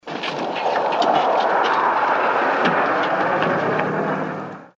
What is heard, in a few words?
jet